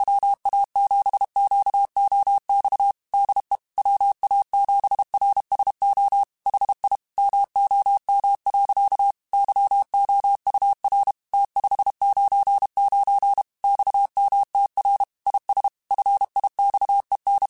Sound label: Morse Code, QSOs